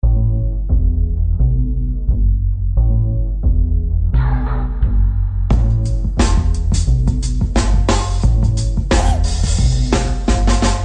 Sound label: Bonus Scratches; Burntablism Volume 2